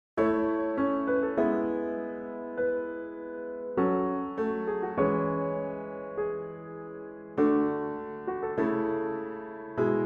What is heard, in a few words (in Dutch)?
tentamen harmonisch, solfege